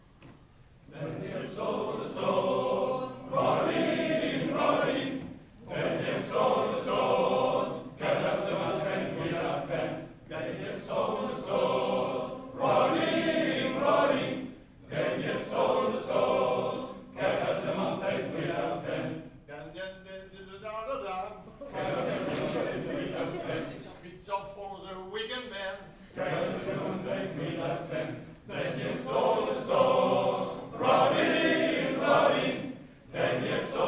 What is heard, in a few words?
saw